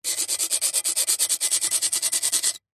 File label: foley stone or flat rock knife blade scrapes scratches fast; zapsplat